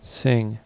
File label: sing